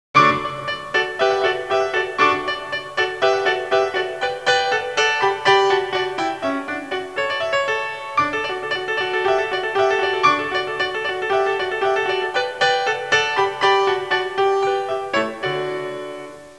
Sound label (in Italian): suonerie classica; musica classical